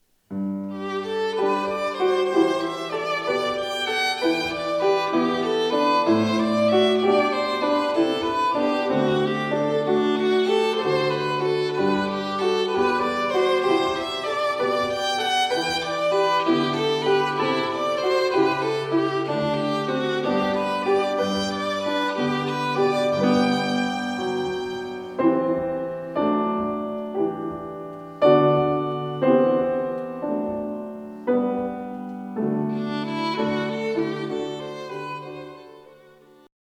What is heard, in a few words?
Piano Violin